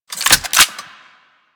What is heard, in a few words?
Glass Shotgun Reload C; Warframe; Tenno; Weapons